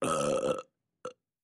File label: Med Throaty Belch; belching